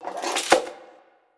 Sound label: Levers; MALL